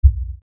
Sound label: LFE Hit, Lfe Hit Low Bass Impact; Blastwave